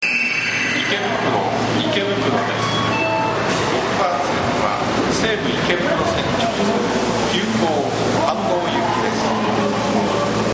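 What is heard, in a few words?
ikebukuro 6 coupling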